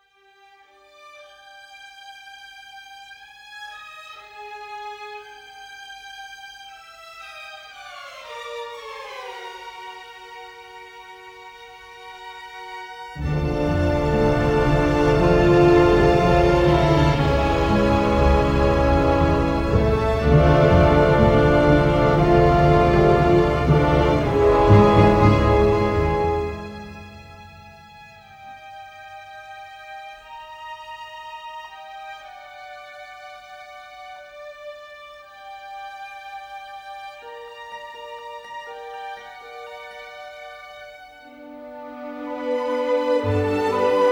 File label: Orchestration Dark Goings On; The Little Vampire